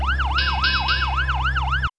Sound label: firetruck